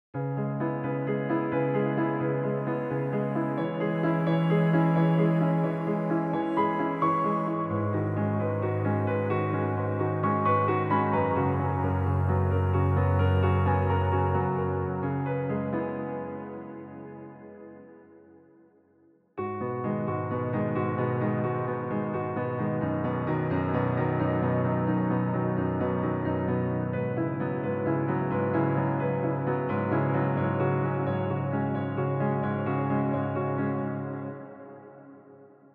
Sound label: the last piano